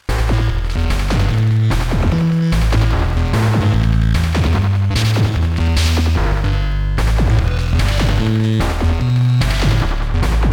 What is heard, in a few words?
gameboy